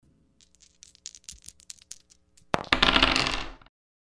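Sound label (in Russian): dice thrown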